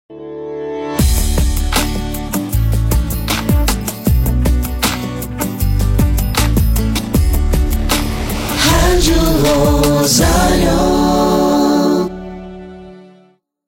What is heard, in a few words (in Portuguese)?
VH JINGLE RADIO LENTA; shopping; ROSARIO